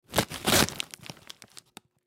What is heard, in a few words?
Popcorn Bag Open, Popcorn Bag Open Fast Food; Household; Blastwave